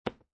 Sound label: Poker Chip Dropping On Felt; Poker, gambling; Cards; Casinos; LynneMusic